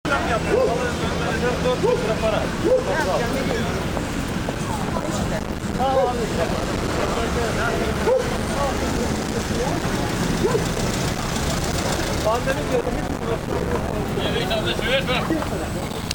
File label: fat dog barking